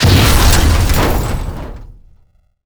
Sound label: impact car fast